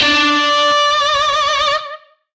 Shawzin D; Warframe; Lizzie Shawzin

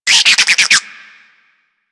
Infested Minion Bark; Warframe

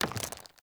Foley Movement; Enemy; Mockeries; Mockery

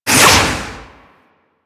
Pirate Cannon Projectile Whistle; Warframe; Warframes

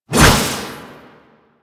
Hover Whizzby Envy; Enemies; Warframe; Warframes; Pax Duviricus

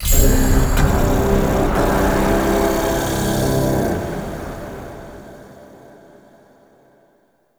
Tablet Correct; Warframe; Duviri; Easter Eggs

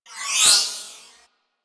Swarm Cannon Projectile By; Warframe; Weapons; Corpus

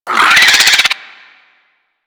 Infested Biped Attack, Vocals; Warframe; Moa

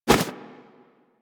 Arm Swing Foley; Warframe; Purgatory Warrior

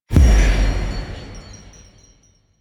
Song Incomplete, Music, Shawzin; Duviri; Warframe

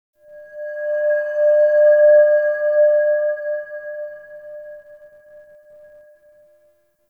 Singing Bowl; Warframe; Ambience; Clan Dojo; Dojo Randoms

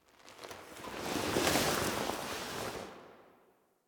Foley A; Warframe; Quests; The New War; Lotus Gem Part One

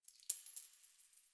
Coin Drop; Warframe; Ambience; Nineteen Ninety Nine

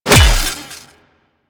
Melee Hammer Impact Robotic Flesh; Warframe; Weapons; Hammers

